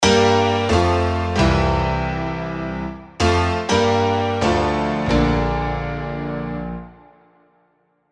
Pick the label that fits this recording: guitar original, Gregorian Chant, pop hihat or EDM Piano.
EDM Piano